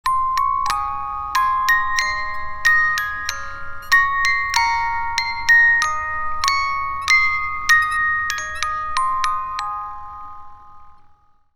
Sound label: Music Box; Lullabies